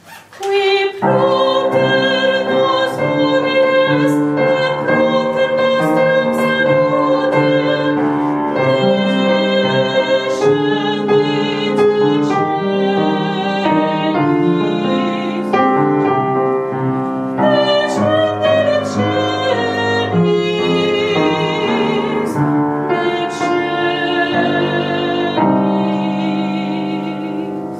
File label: mezzo; credo